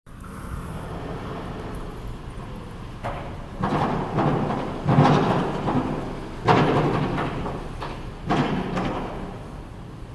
Construction

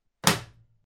Wood Breaking

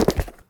Concrete Footstep

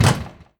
Collision

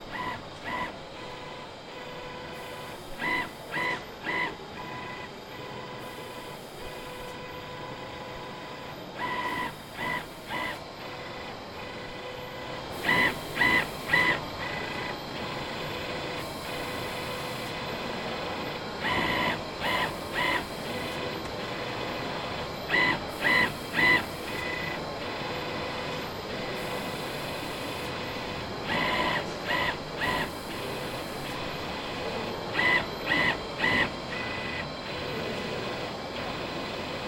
Servo; Kleiner Servo

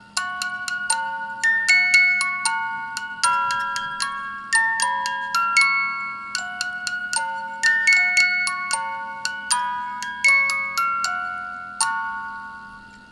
Music Box Mulberry Bush

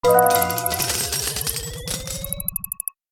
Cash Score Bonus

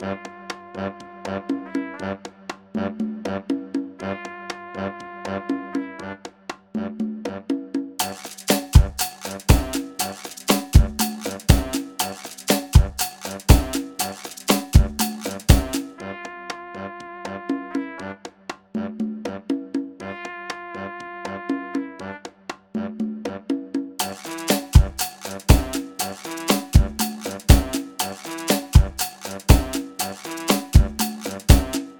Loca Salsa

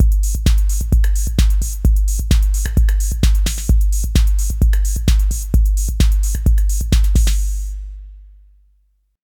Drum loop

